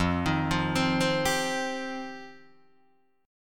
F 7th Suspended 2nd F Guitar DADGAD; F7sus2; 3,6,3,5,3,5; arpeggiated

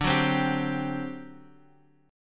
D 11th, Guitar, Half Step Down

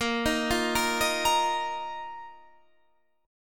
A sharp Suspended 4th Guitar Standard; arpeggiated; A#sus4; JGuitar

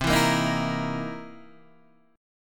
C 7th Sharp 9th, guitar; C7#9 Chord {8 7 8 8 5 6} strummed

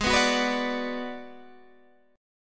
Ab Minor 7th Flat 5th Ab, down, Guitar, Standard; strummed; JGuitar

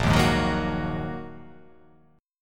A sharp 7th Suspended 4th Sharp 5th down

